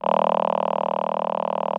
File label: VP-330, String Machines